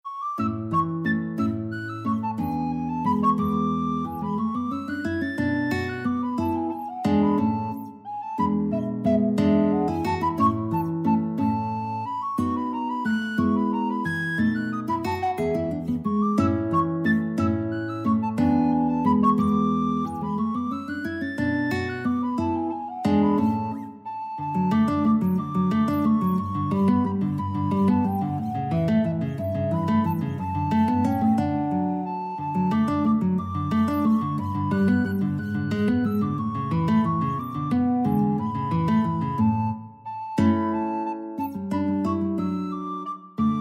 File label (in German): Klangbeispiel Valses fuer Csakan mit Pianoforte oder Gitarre; 1 Ernest Kraehmer Valses Op 21